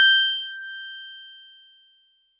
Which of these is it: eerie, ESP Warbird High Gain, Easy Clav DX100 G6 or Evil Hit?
Easy Clav DX100 G6